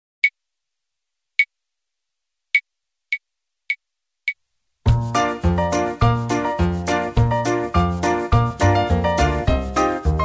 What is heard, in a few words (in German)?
Treppe hoch und runter, Bläserklasse, blechblasinstrumente